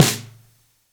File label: Long Tail Reverb Acoustic Snare Sample C# Key, Royality free steel snare drum